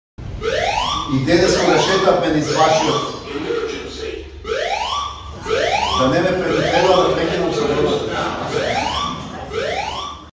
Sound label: north macedonia eas alarm